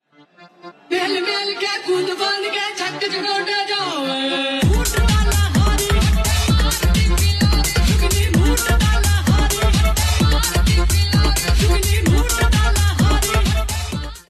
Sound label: beat arabia